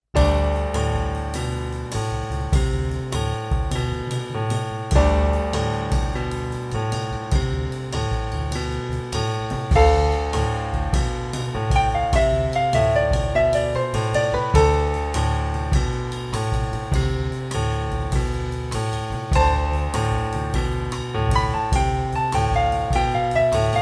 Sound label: Dorian Phrygian Exploration; Jazz